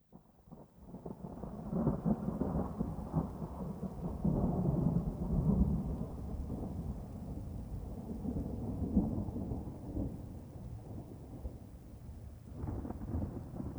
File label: Thunder Outside